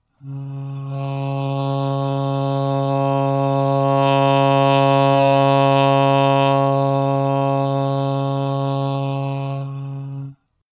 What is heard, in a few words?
Volume change; Westside Studio